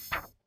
report open

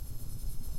crop dates

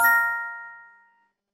result chime

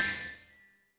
unlock crop, sfx

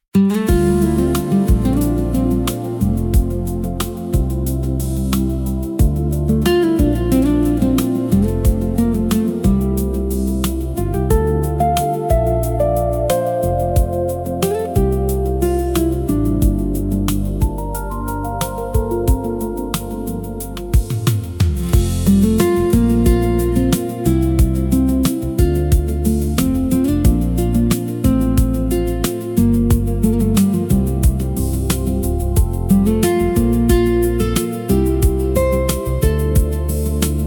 alpine freight light

